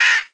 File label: vulture drop; GoldMinerJoe